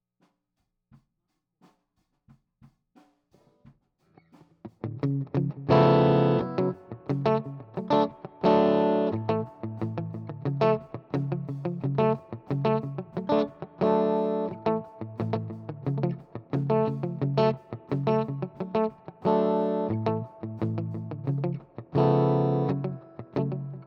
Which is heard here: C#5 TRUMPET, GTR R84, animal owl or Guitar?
GTR R84